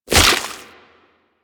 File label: Tree Seed Explosion; Ambience; Warframe; Infested Eris